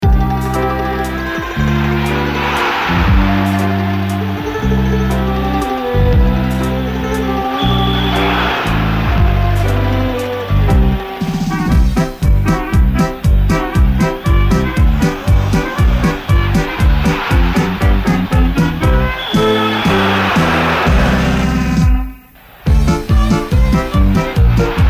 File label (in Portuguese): Trilha; IpanemaFM; Spots Agencia Jinga